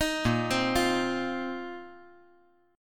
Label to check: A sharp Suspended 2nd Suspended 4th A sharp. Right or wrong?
Right